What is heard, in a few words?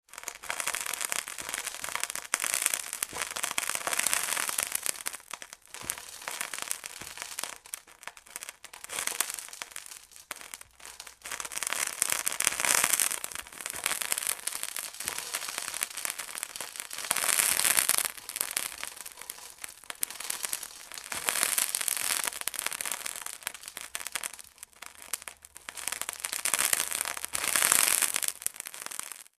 sizzle